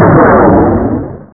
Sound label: Pad explo; DX-Ball